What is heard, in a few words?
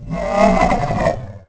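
scream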